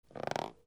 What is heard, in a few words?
SQUEAKS